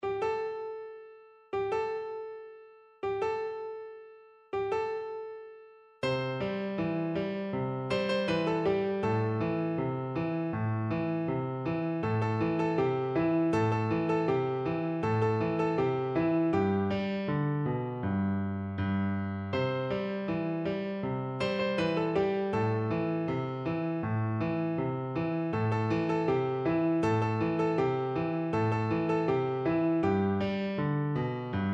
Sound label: magalina zoula, piano